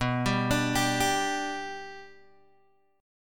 B-Minor Sharp 5th, Guitar, DADGAD

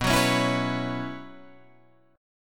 B Suspended 2nd Suspended 4th, Guitar, Drop D

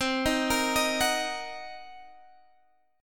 C Minor 7th Flat 5th, Guitar, Standard; Cm7b5 arpeggiated; JGuitar

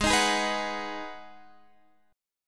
Ab Minor 7th down Guitar Standard; strummed; JGuitar

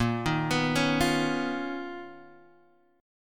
B Major Sharp 11th A sharp

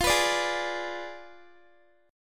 F Diminished 7th F down Guitar; strummed; tuning 4E,4A,5D,5G,5C,6F

